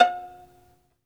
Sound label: Synclavier Strings, SOLO VI; ILIO